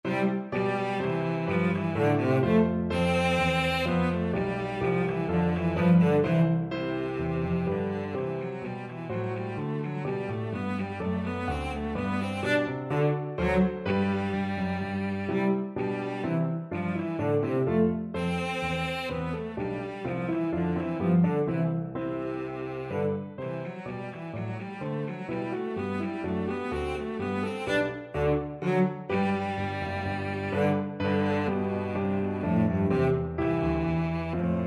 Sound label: bourree, cello